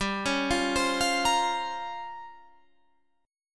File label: Bb Minor 6th Add 9th, guitar